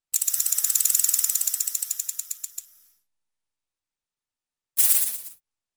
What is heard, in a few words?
Rattlesnake Tail; Cartoon; Hollywood Edge; Cartoon Trax